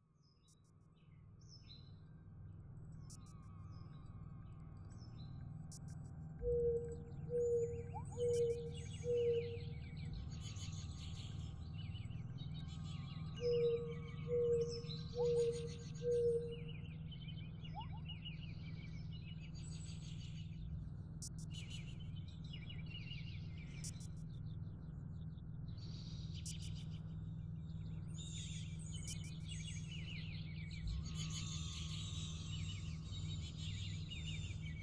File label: Birds, Wind and Synth